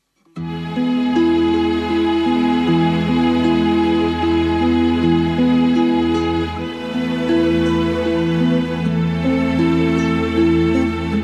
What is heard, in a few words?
3328 hz